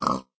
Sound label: say, pig; minecraft; mob